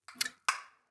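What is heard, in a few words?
caribbean castanets; sound kit; nova sound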